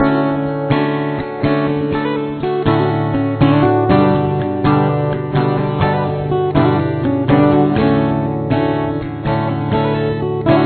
outro

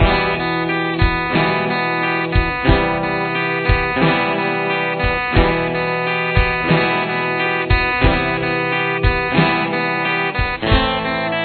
acoustic, guitar

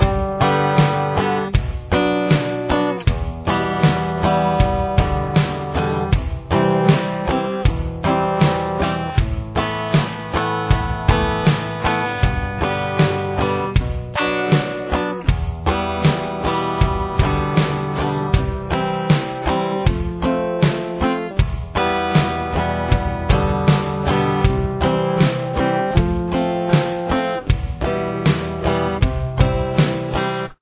verse guitar

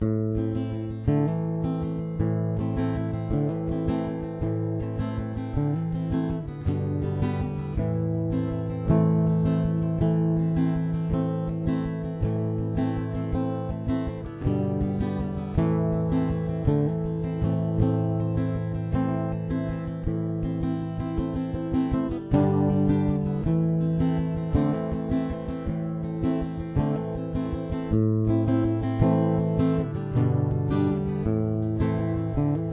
v1 rhythm, guitar

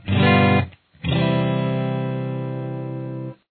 g dominant 7th resolution, guitar

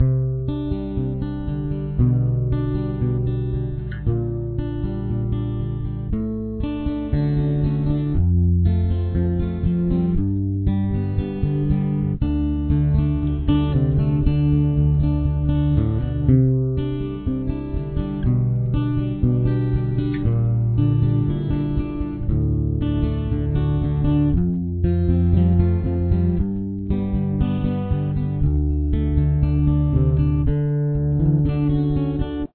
full verse; Tuning: D, A, D, G, B, E

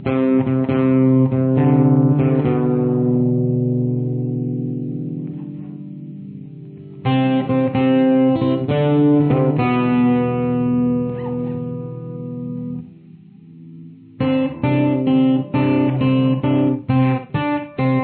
fills, guitar

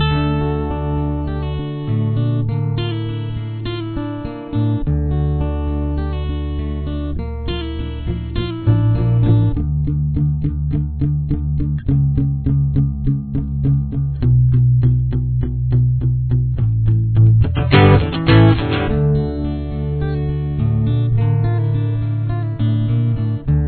chorus, guitar

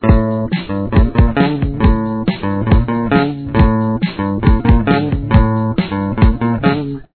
bass

